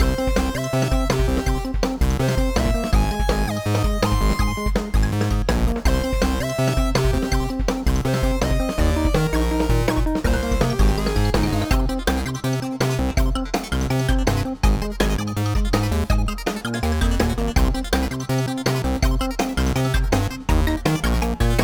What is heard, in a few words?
Invulnerable; Jones On Fire